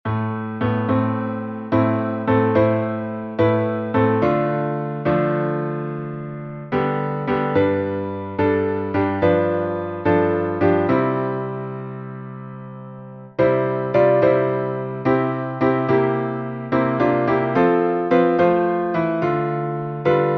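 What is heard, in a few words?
midi piano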